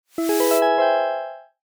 discovery spark; eyecatch

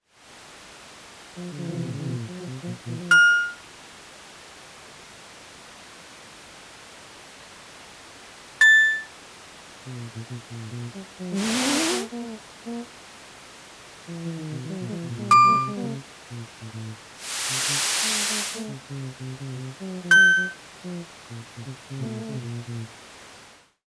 cafe room murmur

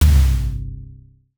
headline boom

